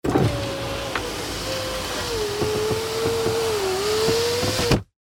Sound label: Car Window Roll Up